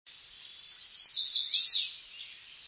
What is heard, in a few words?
redstart american; birdsongs